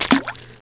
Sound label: Game Lure In; FishingBass